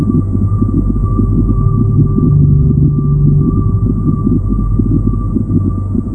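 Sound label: reactor ambience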